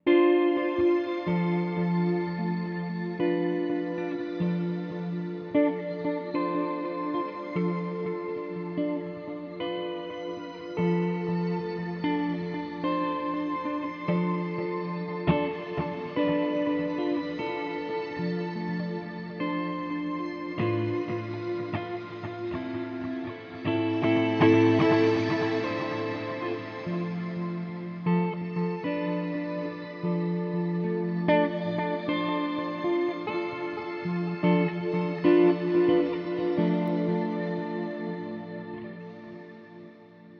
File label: Mooer GE300 Lite Marshall Octave Delay